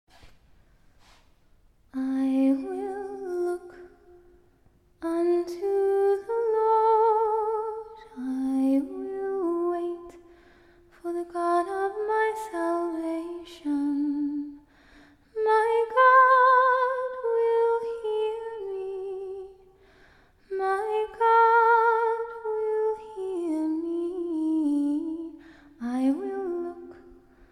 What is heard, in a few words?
acapella scripture